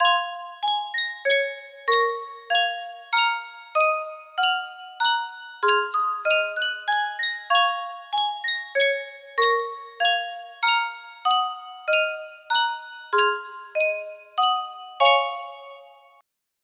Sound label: sankyo music box